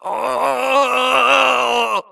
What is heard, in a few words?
grunt electro; Titanfall 2